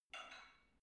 barbell only lift up reverberant soft, fitnessstudio